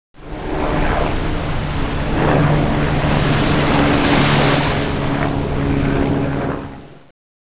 airplane

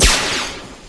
Projectile Tracer; Earth and Beyond; EA Games

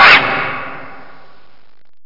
zap

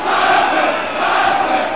CHANT

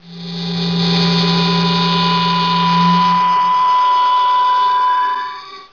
Ground Dematerialize; StarTrek Demo; Star Trek Audio

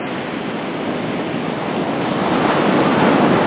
SEASHORE; Groovy Bytes: Behind the Moon

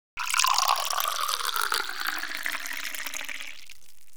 LIQUID

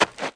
foots dirt

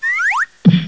ladder

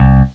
deep bass, string instruments; Sound Effects for Multimedia - Volume 1

